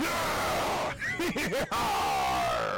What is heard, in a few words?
yeah heheheharrr